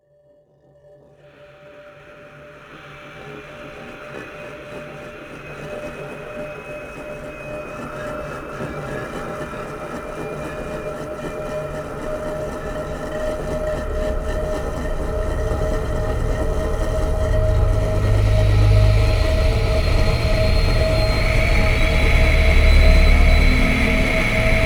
riser; daemon kettle

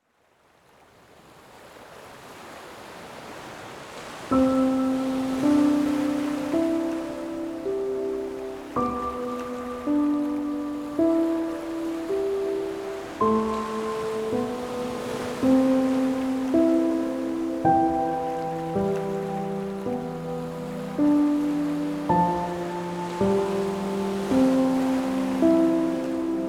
sleep music with ocean sounds

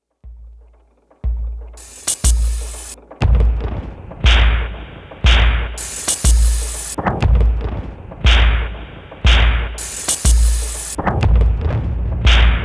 industrial soundscape

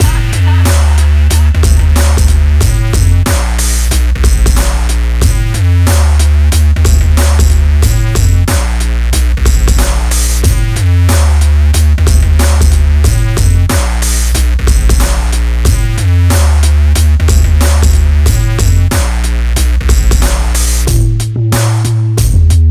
bass attack

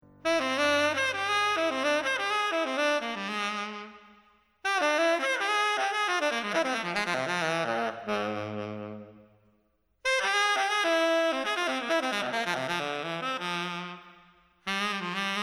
tenor cadenza

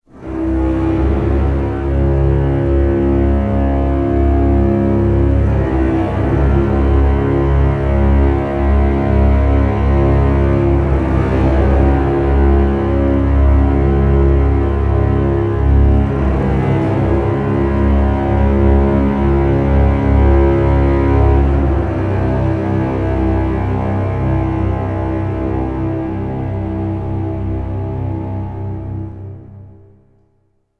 orchestra drone no perc

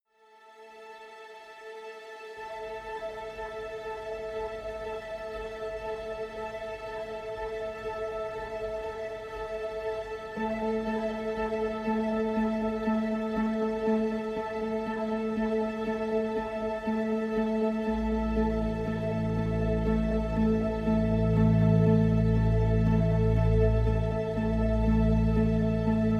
no piano

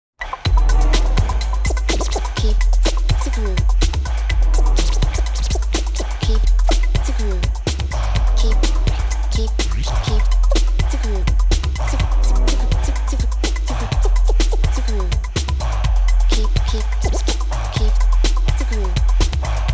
breathing bass

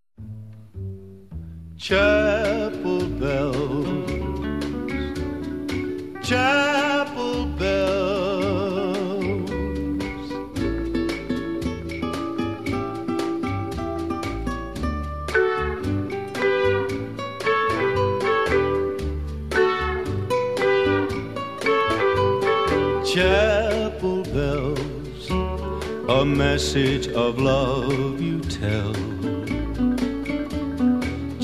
chapel bells chime

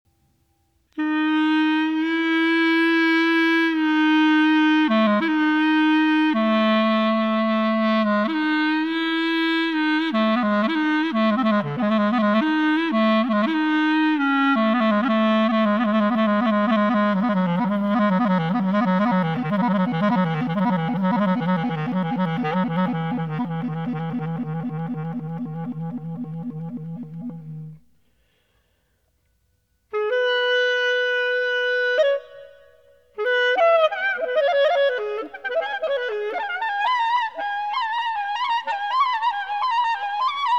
clarinet

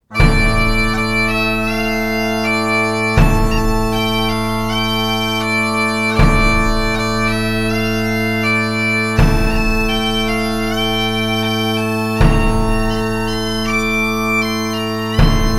funeral march instrumental

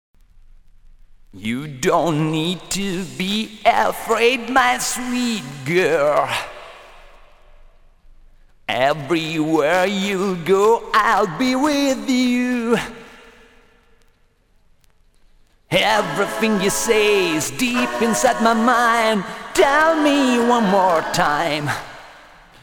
acapella

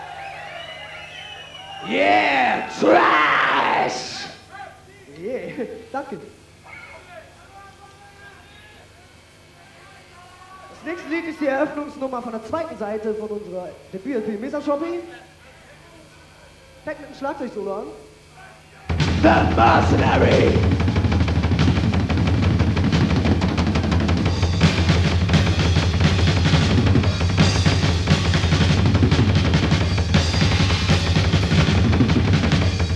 drum solo

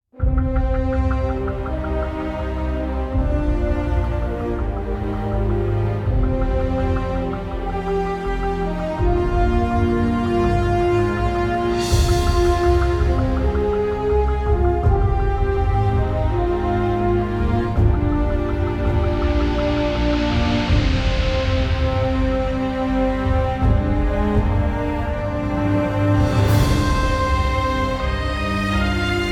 thunder quickly thickening no choir; icon music

